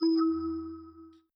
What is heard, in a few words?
error